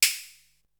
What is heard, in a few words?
Open hat; Drums; ZERO G - Datafile DISC 3